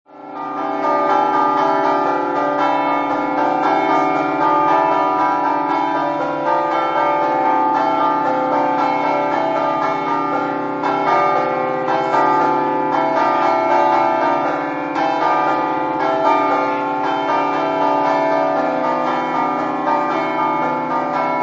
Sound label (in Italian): scampa, campane